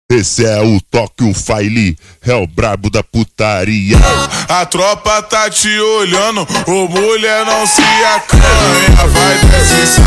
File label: Phonk MONTAGEM Slowed